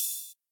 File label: normal hit whistle